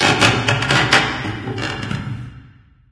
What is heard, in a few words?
amb wood creak, ambient organic; video games; source engine; portal2